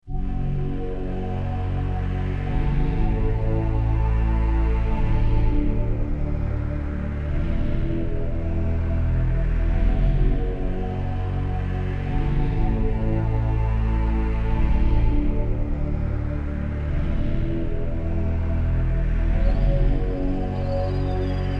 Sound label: dark side ambient